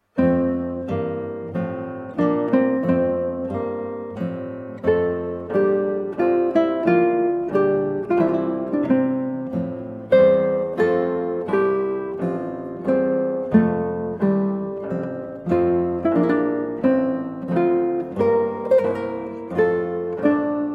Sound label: Adagio from Recorder Sonata in A Minor, Guitar